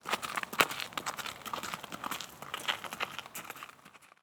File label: horse walk; anemoi